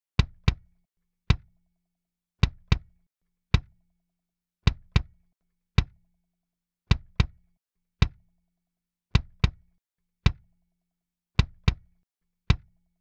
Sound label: Kick WITH AIR BAND at 2.5K MD 421